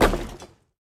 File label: Break Generic